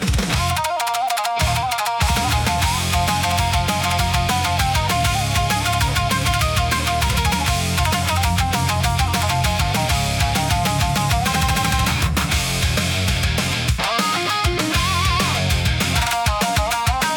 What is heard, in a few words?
intense japanese rock